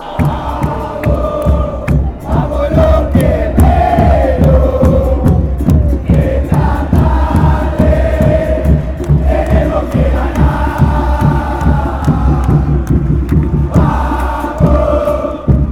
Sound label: huracan chant, fan chants